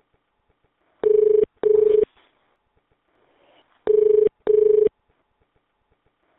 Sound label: Ringing; Kerio Operator